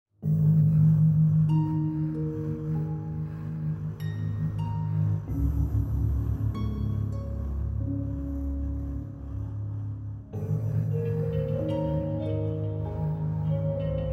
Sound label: GTR; This comes right after the main title song.